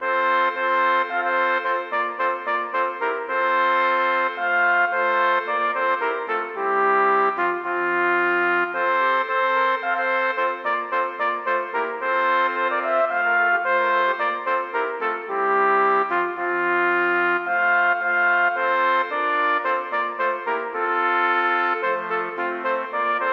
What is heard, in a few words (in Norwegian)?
Intro for fire trompeter; Bang